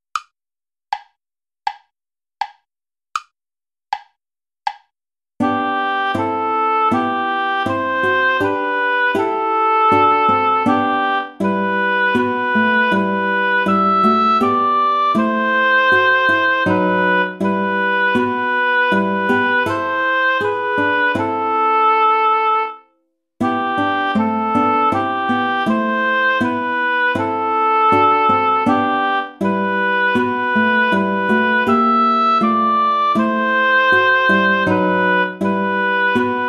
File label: play along without guitar; reger max der mond ist aufgegangen